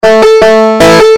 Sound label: banjo